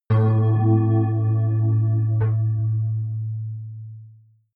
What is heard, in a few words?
synth bass bell